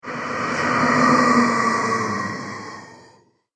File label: Ghostly Halloween Monster; Ghost Sound Effects